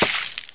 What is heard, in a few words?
SPLAT; Beavis and Butt-head in Virtual Stupidity